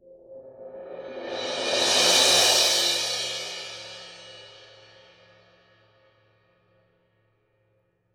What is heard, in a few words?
cymbal roll, percussion; Sonatina Symphonic Orchestra